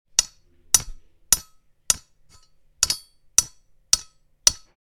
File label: Metal hammer hitting metal panel